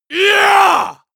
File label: male combat attack long